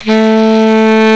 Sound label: SAX, REEDS; CMI Sound Library Rev 1.3